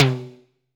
TIMBALE; Greytsounds; Scott Peers Sound Engineering

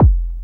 KIT, Dance Mega Drums; Best Service

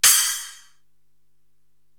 Splash Cymbal, Korg TR-Rack

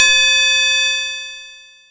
TUBELL HI, TUBULR; GONGS+TUBULR

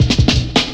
Beatle Cut, Jungle Drum Loops; Zero-G Jungle Warfare 1, 2 & 3

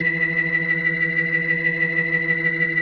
E3F, B3, Vintage Keyboards

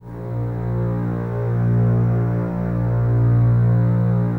Basses A#1, String Ensembles; Miroslav Vitous; 90 s Sample CDs

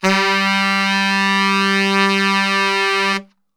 G 1 SAX STR

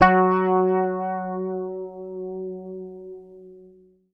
F#, Bass; Sampleheads; Will Lee Bass Library

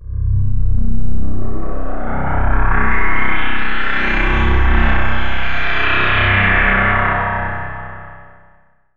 DARKNESS, PSYCHO; AKAI Anime Sound FX

